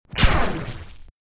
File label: Redlightning Zap